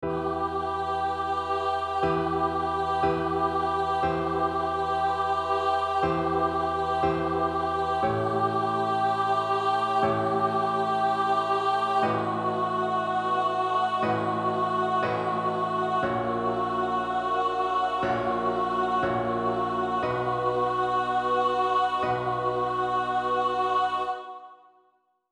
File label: Alto; Gratias agimus tibi